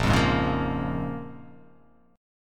A sharp Minor 7th, A sharp, down

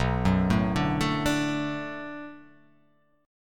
B Minor 7th B, chord; Bm7; arpeggiated; x,2,4,2,2,4,4,x; JGuitar

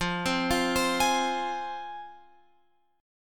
F Minor, F, Guitar, Open F; arpeggiated

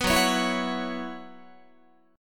F sharp 7th Suspended 4th, Guitar; strummed; 14,14,16,14,17,17; F#7sus4 Chord

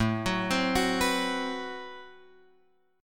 A Minor 6th Add 9th A, Chord; Am6add9 Chord {5 X 4 5 7 7} arpeggiated

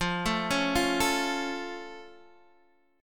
F Augmented F Guitar Half Step Down

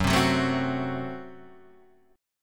F sharp Diminished; F#dim Chord {2 3 4 2 1 2} strummed

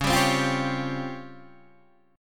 C sharp Major 7th Flat 5th Guitar Open D

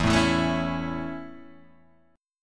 E Suspended 6th E

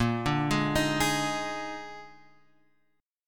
A sharp 11th; A#11 Chord {6 5 6 X 4 4} arpeggiated

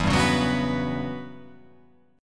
E Minor 6th Add 9th chord

